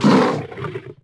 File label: Lion Die Vox; Civ4 warlords